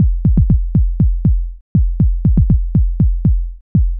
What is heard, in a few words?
sim kick; hydrogen